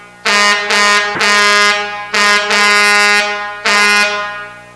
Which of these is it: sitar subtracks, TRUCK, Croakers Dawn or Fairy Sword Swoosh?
TRUCK